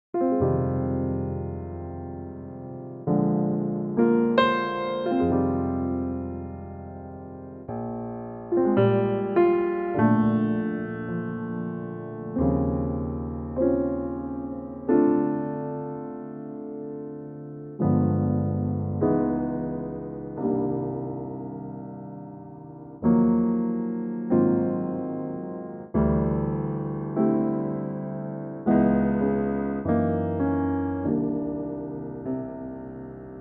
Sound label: Bb piano